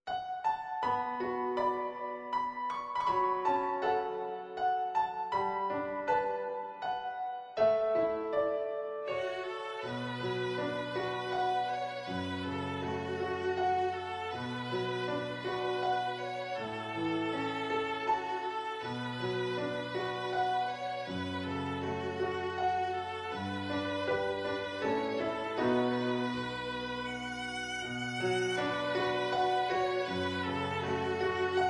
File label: Folk Song, strings